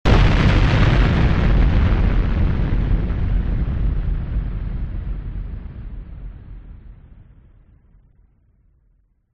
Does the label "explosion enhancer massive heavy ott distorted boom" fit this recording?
Yes